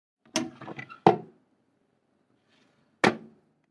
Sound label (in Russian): zvuki sidenia dlia unitaza